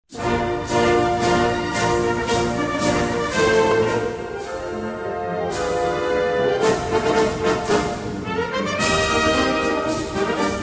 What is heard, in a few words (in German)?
wollerauer marsch